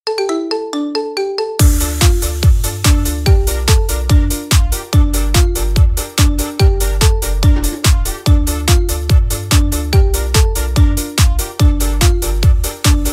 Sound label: ringtone; iphone